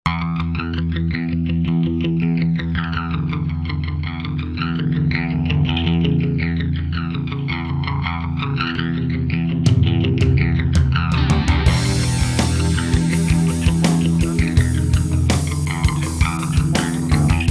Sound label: rock guitar